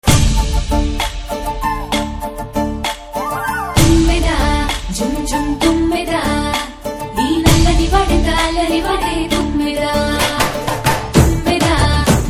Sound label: Telugu Ringtone